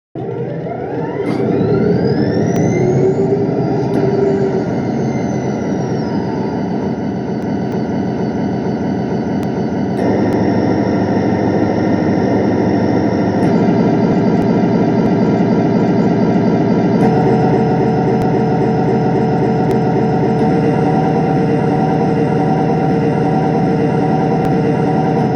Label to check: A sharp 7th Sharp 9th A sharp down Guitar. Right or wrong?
Wrong